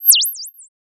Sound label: Sound of a bird tweeting nicely